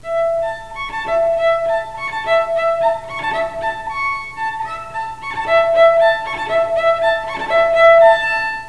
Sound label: wake up, cello